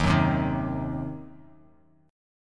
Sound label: Eb Minor 6th, chord; Ebm6 strummed; JGuitar